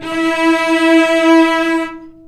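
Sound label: cellos attack E4 R, String; East West; The Ultimate String Collection; VCS ARCO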